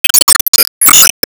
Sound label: Shock Wave Impact; gamerip; disney star smash; android